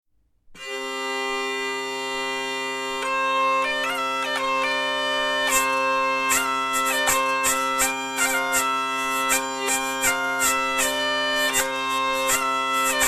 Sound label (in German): drehleier